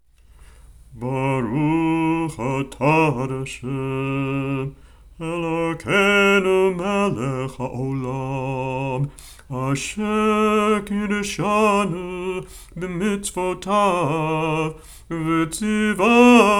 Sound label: Hallel Opening Blessing